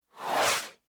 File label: whooshes wipes airy slices